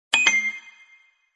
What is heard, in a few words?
samsung s23 notification